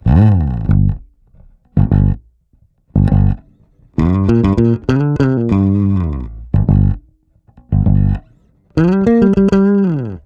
tube test bass only NOS; reddi tube di

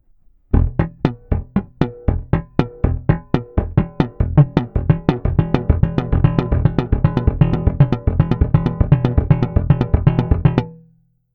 Triplets, slap exercise

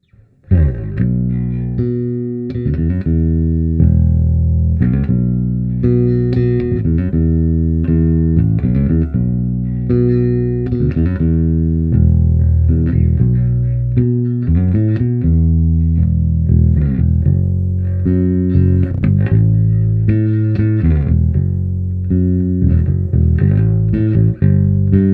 BASS ONLY; berg ae112; ae 212 cabs

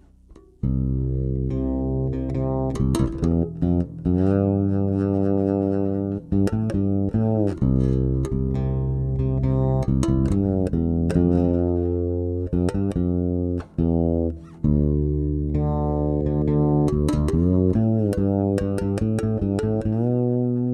Q Tuner Bridge, pickups

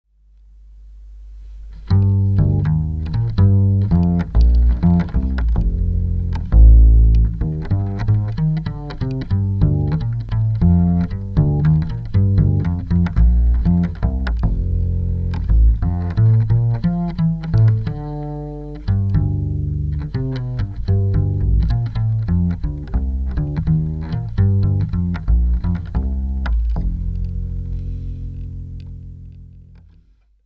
Stico electric upright bass

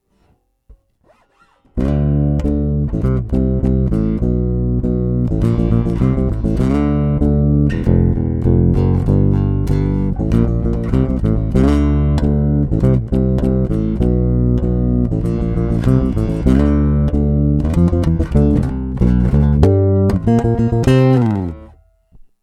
flat finger; 8 string

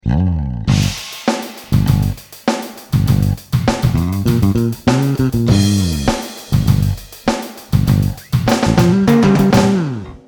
BASS AND DRUMS; suhr classic j

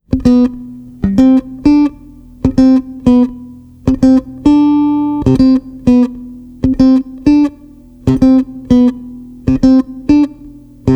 HARMONY

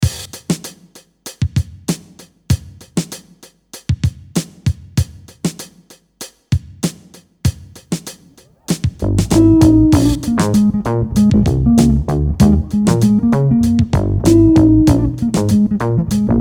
BASS AND RHYTHM ONLY; crowther audio pedals

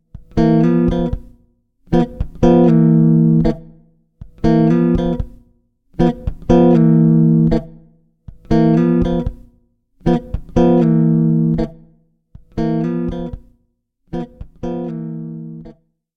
chords stereo eq; Suhr Classic J oly white

